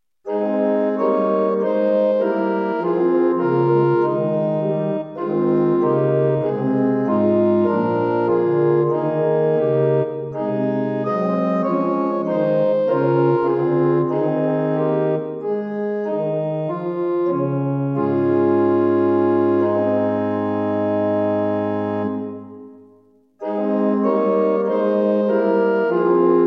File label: Open Diap ET 6th Comma